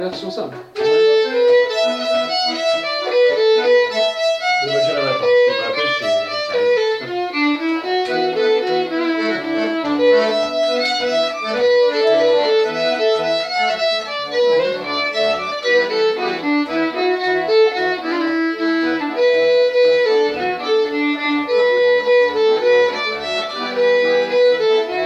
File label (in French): polka theme